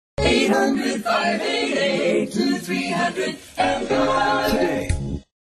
flooring jingle; empire

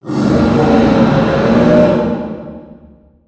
roar far; arzuros

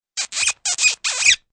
ear cleaning squeaks

